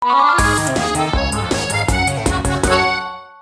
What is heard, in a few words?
Successful Leap!; Pizza vs. Skeletons